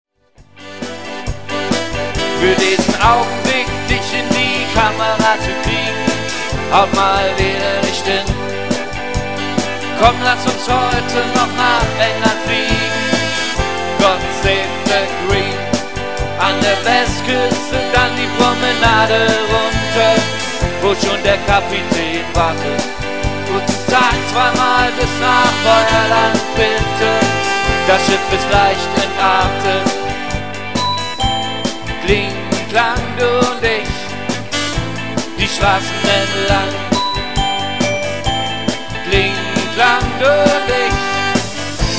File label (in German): Kling Klang